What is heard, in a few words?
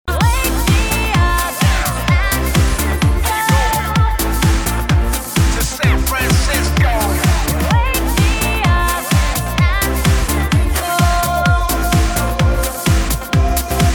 Club Party Banger